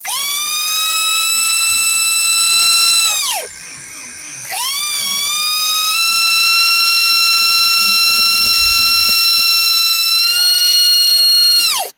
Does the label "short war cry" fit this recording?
No